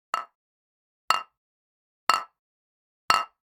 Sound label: Generic Click Glass Small